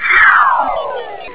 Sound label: dissolve, scifi